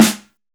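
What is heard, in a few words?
roomy acoustic snare sample a key, tuned to the A note; snare-drum-samples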